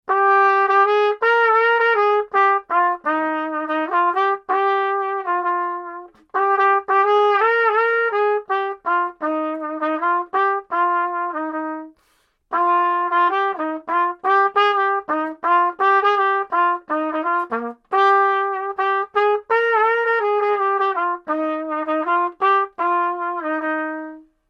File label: Trumpet